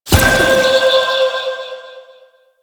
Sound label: Spirit Primary Fire G; Warframe; Grineer Thumper Bot; Sentient Spirits